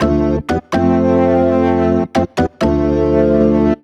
DEEPHOUSE ORGAN 125 A

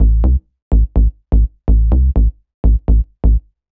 DEEPHOUSE BASS 125 A

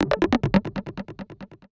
FX SHOT TEKNO 140; Techno2; Singleshots

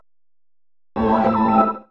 HOUSE FX 125

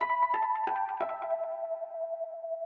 ETHNO 90 D

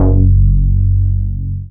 BASS MINIMOO

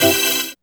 dance chord

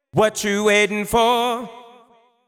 deep house male, 125, A, vocals

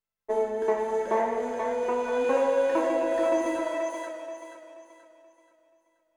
FLUTE ETHNO 125 A

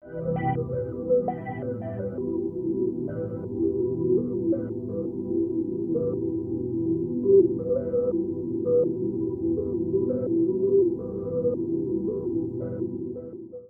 SPACE PAD 140 A; SpaceSounds

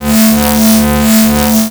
TECHNO FX 140

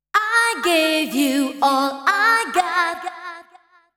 deep house female, 125, A, vocals

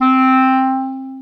Alto Clarinet Portato C3; VarazdinOrchestral

